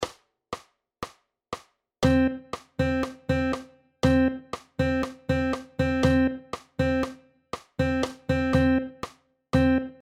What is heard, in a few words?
Melodic Rhythm Rhythmus Beispiel